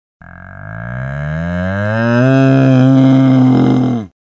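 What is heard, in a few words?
sounds of bulls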